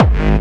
Kick n Bass

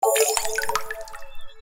Water email alert; Nokia Ringtones; Audio themes

